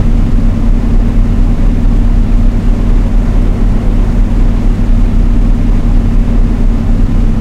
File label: blenheim cockpit, engines; War Thunder